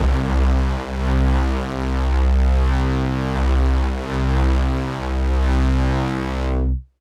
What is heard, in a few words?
Pad C, Synth Prophet One Shots; Electronisounds